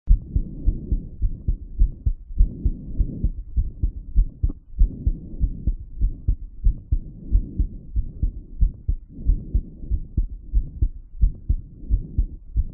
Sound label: heartbeat heart after light exercise